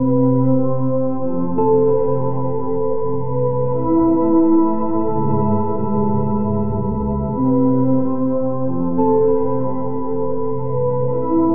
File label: minecraft style titl